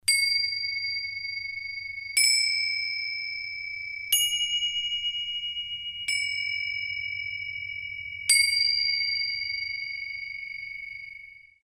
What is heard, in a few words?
tingshaw